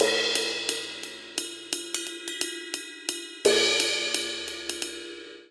Rolling Hip Hop Cymbals Only 87 bpm; 4 4; MIDI Clips; Ableton; Factory Packs; Drum Booth

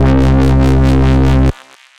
Ulterior, Analog Bass; Ableton; Retro Synths; Analog Bass Vol 1

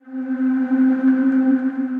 Ghosts On Tape, Disembodied Voices; Multisampled

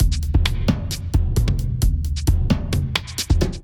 Kit groove 132 bpm, Drum; MIDI Clips; Borella Kit groove; Ableton; Factory Packs; Drum Essentials

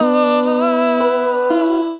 (D min), Basic Vocal Racks; Voice Box; Harmonic Vocal Racks

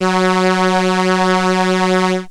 Solina Viola F#2; Analog Synths; Ableton Foundation FreePacks

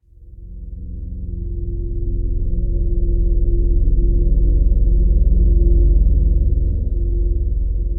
Hallow Texture, Drone; Laboratory

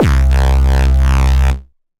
Kick; Ableton; Factory Packs; Skitter and Step

